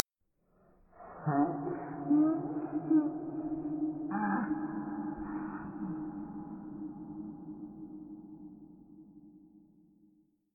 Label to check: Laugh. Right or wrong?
Right